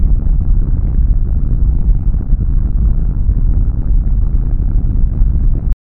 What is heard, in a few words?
corridor echo rumble